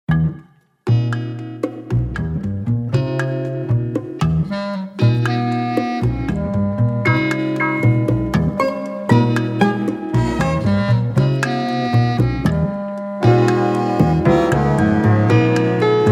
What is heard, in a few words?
Swanky French Cafe; Sample Library Tracks